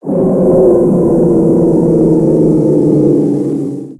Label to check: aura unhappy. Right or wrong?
Right